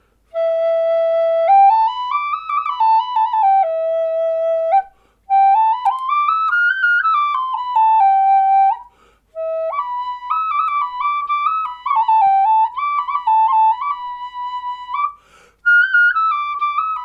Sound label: E5 neck flute